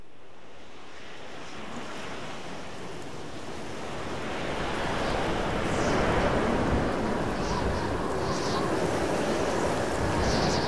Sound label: blizzard